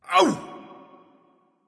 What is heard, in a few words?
grunt hurt; smite; npc; olympus